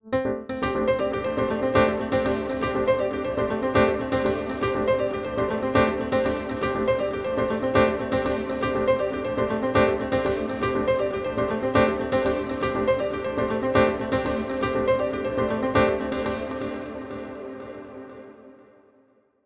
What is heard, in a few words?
Ghost Delay and Dry, recirculate feedback echo; Newfangled Audio